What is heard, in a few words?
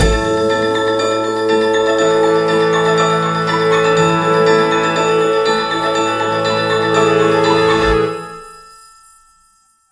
big wins base; PushGaming; Peter And The Lost Boys; peter Pan